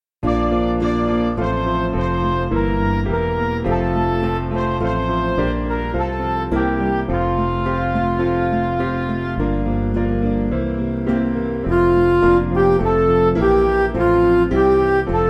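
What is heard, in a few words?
Music Backing Files for Churches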